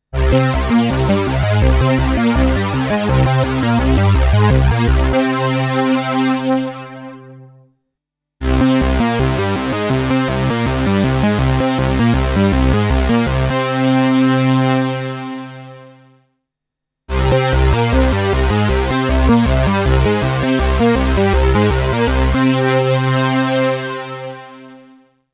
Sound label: Polysix Unison